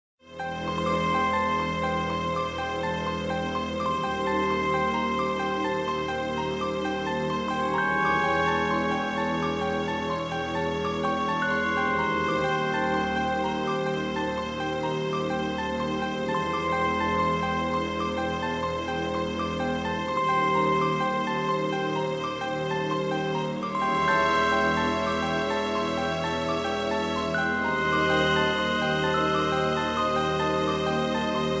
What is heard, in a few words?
bleep